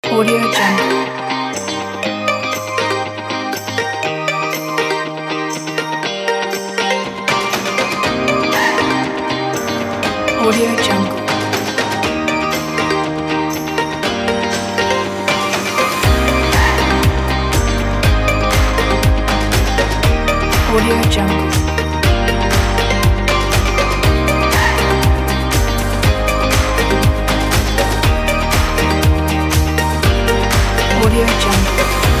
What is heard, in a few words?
be happy